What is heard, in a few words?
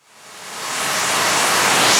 FX Uplifter